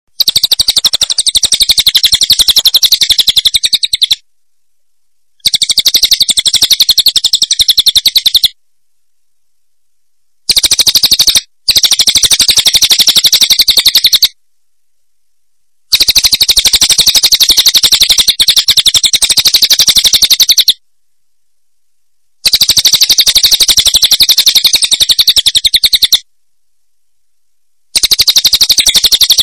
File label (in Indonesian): cililin